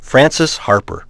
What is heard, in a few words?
HARP BITE